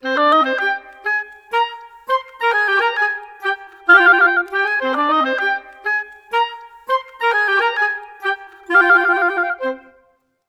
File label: 100 C min, Woodwinds tempo; PowerFX; Downtown Orchestra